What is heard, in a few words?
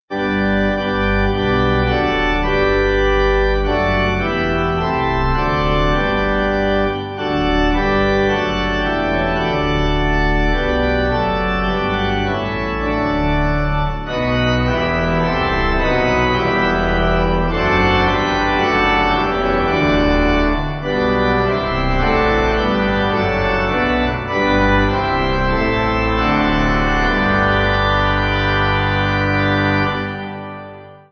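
pipe organ; Hanover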